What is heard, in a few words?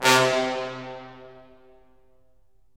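brass cimb, Roland; Roland LA Composer vol. 4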